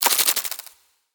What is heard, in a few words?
gambler lose; Corrida Romance; Wazdan